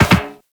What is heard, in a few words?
Land Soft Metal Perforated, Footsteps; Characters; Operator